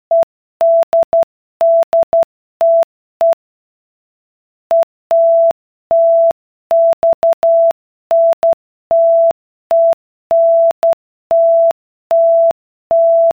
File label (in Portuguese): ritmo velocidade